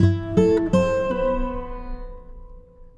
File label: Beach La La, Acoustic String; Sonic Foundry (Sony Creative Software); Friendly Fire